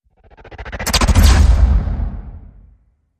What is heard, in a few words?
Hit Sttr Slate Clean